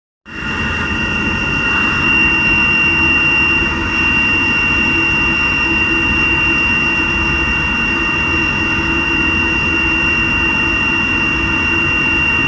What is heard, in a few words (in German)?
Pfeiffen Heizung